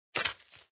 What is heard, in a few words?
throw